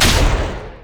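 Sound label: rolling thunder attack; LoL; volibear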